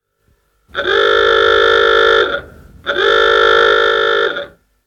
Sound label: exterior klaxon sounded twice